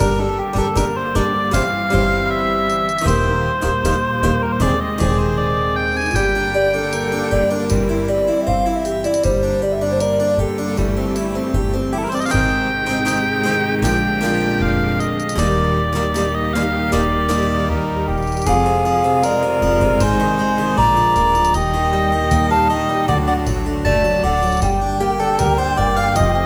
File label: Garden Loop